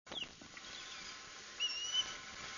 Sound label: osprey; Cheesequake State Park, NJ; 04/10/05 - female crying for male on nest, north side; Crab Meadow Beach, NY